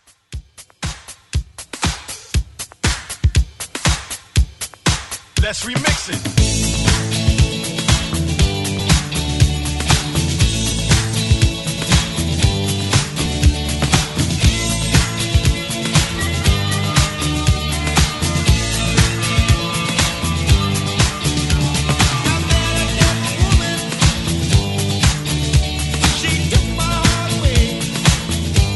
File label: 70s ReDrum 119 Bpm; DJDOLLS